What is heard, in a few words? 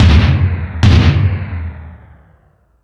Toms, E-MU Pro-Cussion